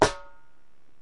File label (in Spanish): tambores